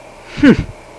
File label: hmmph, People; Sound Library 2000; Wayzata Technology